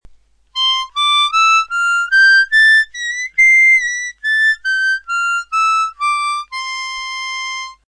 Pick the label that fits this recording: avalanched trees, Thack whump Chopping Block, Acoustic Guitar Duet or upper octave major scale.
upper octave major scale